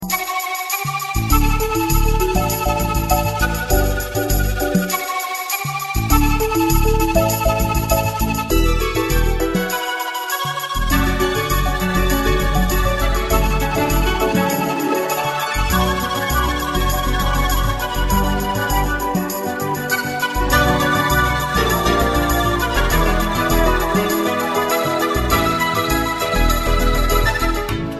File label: mix flute and guitar; Electronica